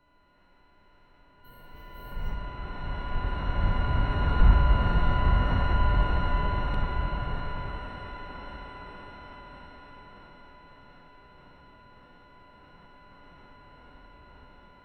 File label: futuristic building, electronics